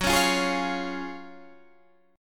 Gb Major 7th Suspended 2nd, Guitar Standard; GbM7sus2 strummed; JGuitar

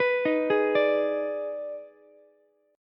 Eb Major 7th Suspended 4th Sharp 5th Eb, Ukulele; arpeggiated; EbM7sus4#5

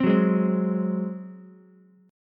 Ab 7th Ab down Ukulele; Ab7 strummed; JGuitar

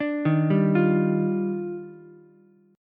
D sharp Major 9th D sharp Ukulele C6, chord; arpeggiated; D#M9; 7,3,3,8; JGuitar

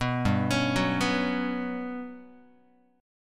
G Major 7th Flat 5th, Guitar; arpeggiated; JGuitar

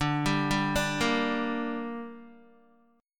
D Suspended 4th Sharp 5th, Guitar; arpeggiated

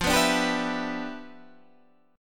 G 6th F; G6/F strummed

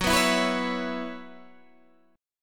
F sharp 7th Suspended 4th, Guitar, Open G

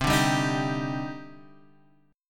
Eb Major 7th C; Ebmaj7/C chord; JGuitar

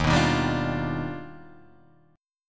D sharp Augmented Major 9th D sharp Guitar Open D

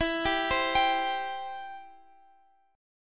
B sharp Major, E; B#/E

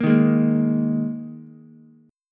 Ab Suspended 2nd chord, Ukulele; strummed; JGuitar

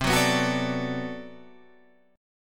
B Minor 7th C, chord

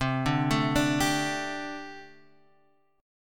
D Suspended 4th C; arpeggiated

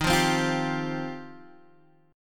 G 7th Suspended 4th Sharp 5th Eb

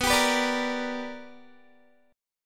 B Minor Major 7th Sharp 5th Guitar; BmM7#5 strummed

